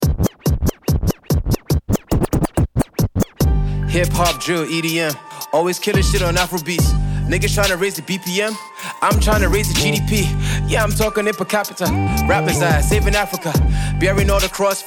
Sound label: rap freestyle